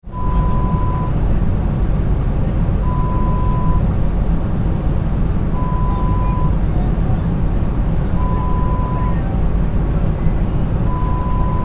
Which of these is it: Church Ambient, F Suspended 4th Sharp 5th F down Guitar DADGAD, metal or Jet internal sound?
Jet internal sound